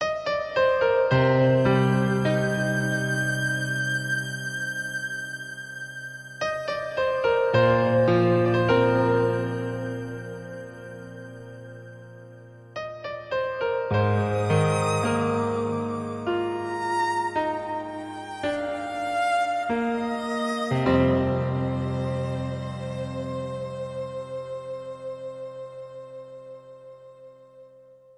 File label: Piano & Violin